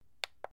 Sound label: button